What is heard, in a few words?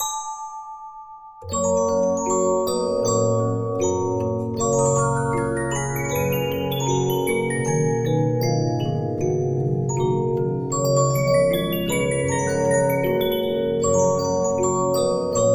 Regina, 15inch disc musicbox